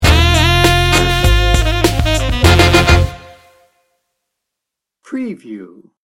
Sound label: sneaky suspicion sting bumper; Comedy, Suspense, Dramedy, Cartoon, Children